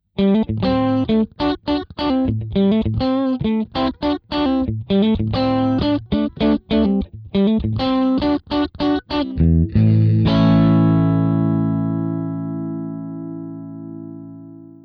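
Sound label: tweed+boost; randall; mts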